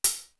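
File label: DYNO EDGE, cymbal; CYMB.HH; East West; Global Sounds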